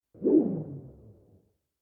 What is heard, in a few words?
slow whoosh airy rumble; prosoundfx